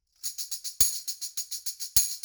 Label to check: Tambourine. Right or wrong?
Right